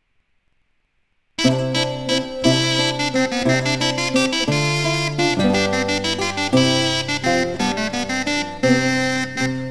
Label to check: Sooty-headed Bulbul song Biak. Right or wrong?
Wrong